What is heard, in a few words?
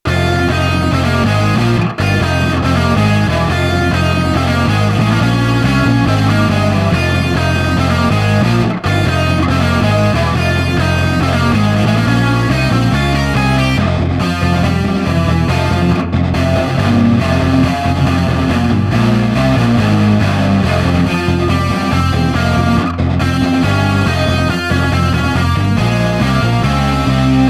chords and lead